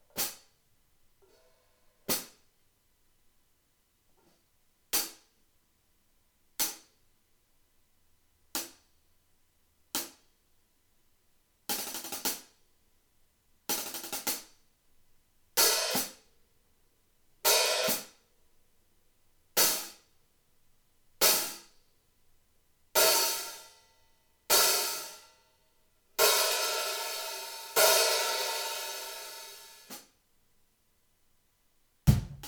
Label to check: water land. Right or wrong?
Wrong